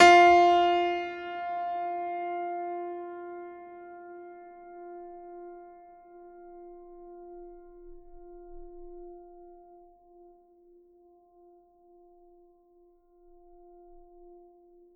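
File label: Classical Piano F3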